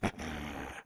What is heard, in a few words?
laugh